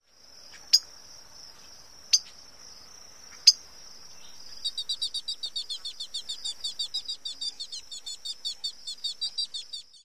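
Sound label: pit calls and female courtship notes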